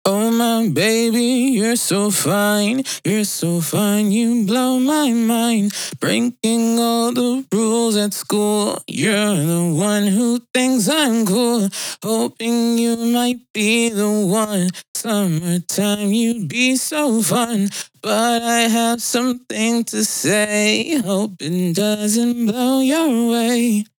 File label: vocals verse